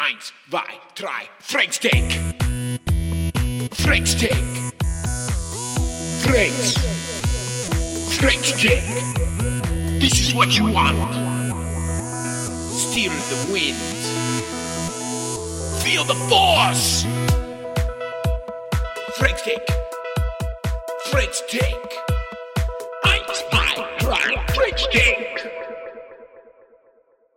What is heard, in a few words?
German Techno